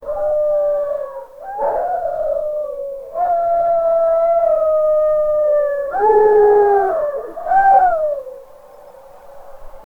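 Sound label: sound effect of wolves howling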